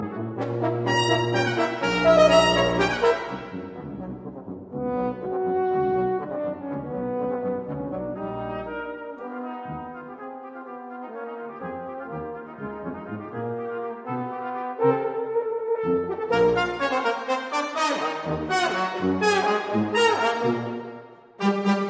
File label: fanfare excerpt